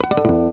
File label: EGUIT CHORDS; Best Service; World Colours